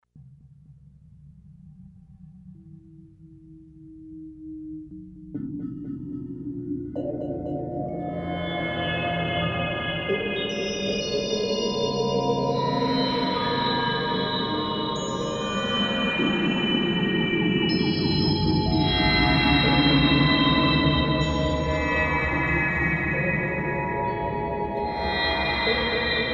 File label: Dark Matter Crystals